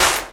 Clap; RnB

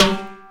Timbale, Hip-Hop, Drum Kit

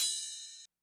Cymbal, Hip-Hop

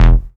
Bass E, Offbeat Bass; Club; VEC1

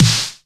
Snare; VEC1

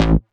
Bass A, Offbeat Bass; VEC1; Club

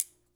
Tink

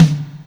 Tom, Hip-Hop, Drum Kit

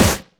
Snare; Takebeat; Club; VEC1

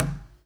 Knock

